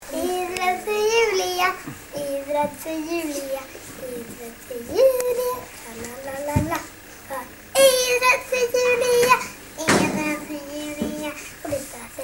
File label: acapella